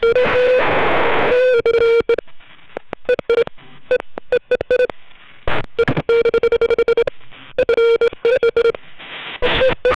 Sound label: a broken mbira loop glitc